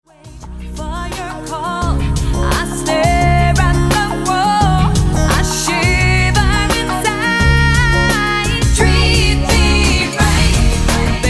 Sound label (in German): lied